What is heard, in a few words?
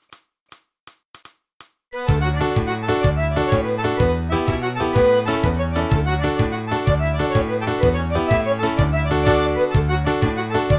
Traditional Irish Jigs D